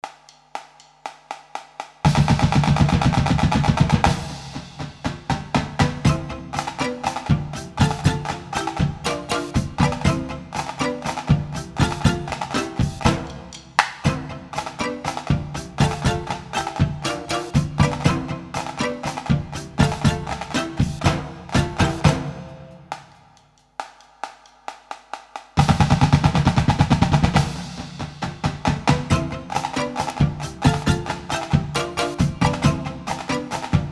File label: Flam Triple Mallet